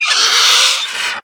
Twilight Whelp Death

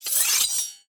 Glaive Medium Underlay Play

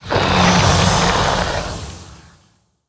Snow Elemental Play

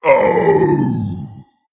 male ogre, Death

